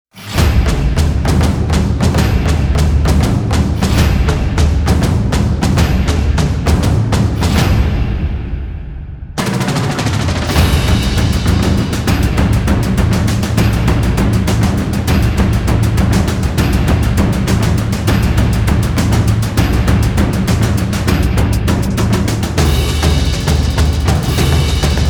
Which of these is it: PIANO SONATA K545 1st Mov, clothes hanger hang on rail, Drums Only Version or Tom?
Drums Only Version